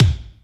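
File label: kick Brt Stab, Alesis D4 drum machine; 300 Drum Machines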